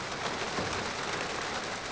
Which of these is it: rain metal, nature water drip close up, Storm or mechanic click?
rain metal